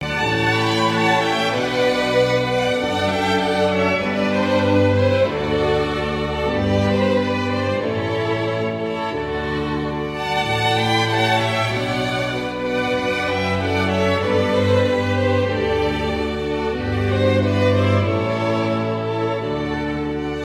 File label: classical violines